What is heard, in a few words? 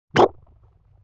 Mouth Hit; Vintage Cartoon; Comedy Vocals